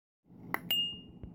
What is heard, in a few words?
MOUTH AND CUP